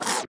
Focus Leave; AudioWizards

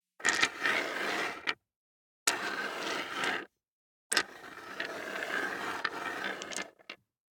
DICE on Hard Wood, Slide, Standard, 6 Six Dice; Samples; ArticulatedSounds; DICE Sfx Party (Extended)

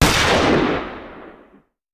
Hand Gun; Sound Ideas; Guns

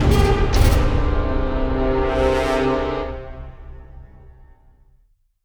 MUSIC EFFECT Orchestral Battle Negative; stereo; Universal Sound FX; MUSIC EFFECTS

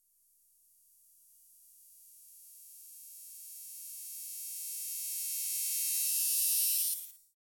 Electric Buzz Rising Thin Electric Buzz; Hollywood Edge; sound designer's toolkit v2

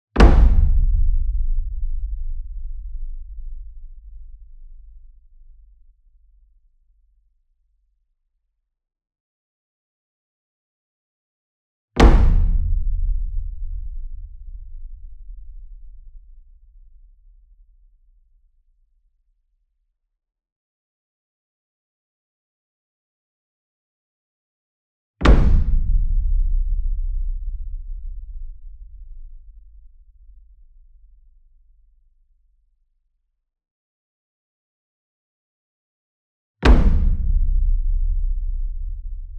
SINGLE DRUM Taiko 60 Inch Flam Soft Beater Near; boom library; Cinematic Strikes Construction Kit

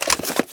Gun Holster Weapon, Weapons Foley; SFX Kit

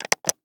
Alarm Clock; Clocks, Watches and Timers; Blastwave

